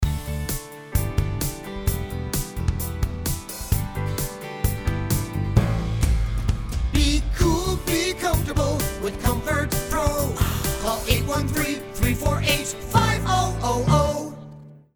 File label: jingle; comfort pro cooling